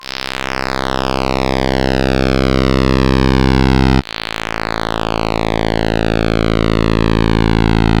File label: Scorched Saw; Retro Noise 3 Instruments